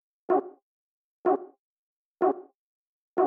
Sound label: Synth Short, techhouse